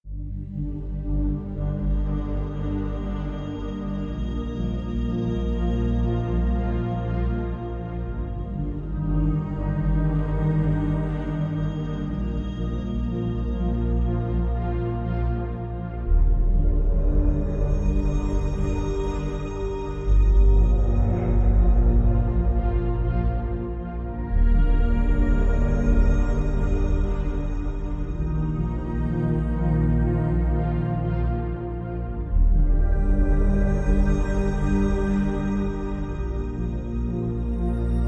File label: Drone, Light